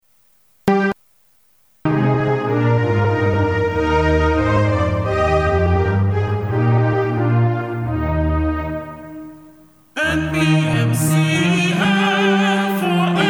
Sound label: Anthem